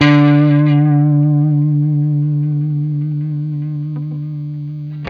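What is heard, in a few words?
blues long vib, Guitar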